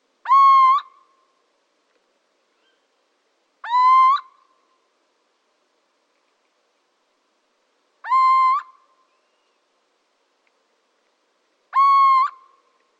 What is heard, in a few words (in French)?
Cheveche, chouette; nuit de la chouette